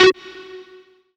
LEAD GT; 90 s Sample CDs; NiceBeats; SynthLine VOL-2

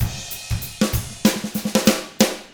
95 FUNK F10 R, drums; ILIO; Double Platinum Drums 1

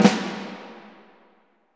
SNR, Orchestral Perc; Roland LCDP03

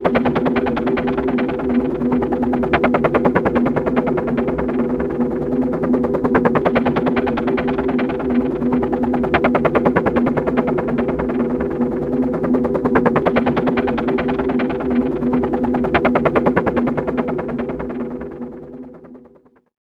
MoonWind E, Lunar Drones; Zero-G Seismic Frequencies

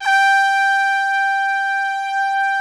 BRASS G4, ORCH BRASS; Greytsounds VOL-1

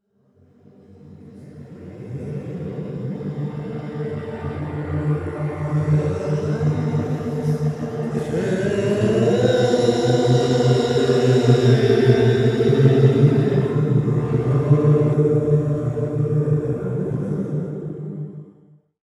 Vox FX; Sonic Foundry (Sony Creative Software); World Pop

